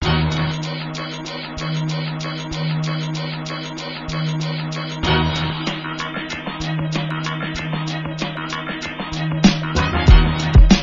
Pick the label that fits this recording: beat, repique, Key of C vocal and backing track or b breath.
beat